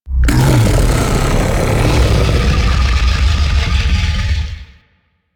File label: Monster Injured; Warframe; Infested